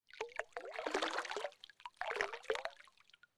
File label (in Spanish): pasos mojados